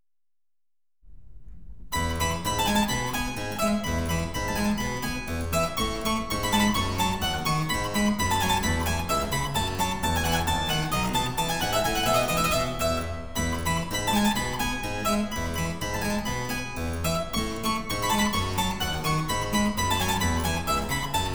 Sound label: Piece for Piano in F; Wolfgang Amadeus Mozart; Piano Works; Piano Variations; K.33B